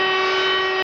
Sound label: Vehicle Tank Turret Turn Loop